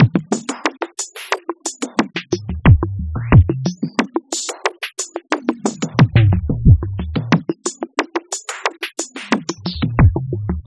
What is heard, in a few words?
machine 090bpm; Zero-G; Downbeat Dance Grooves